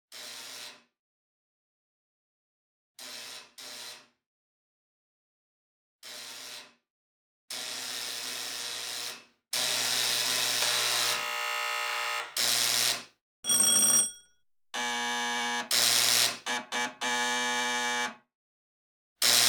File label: Alarms; Sound Design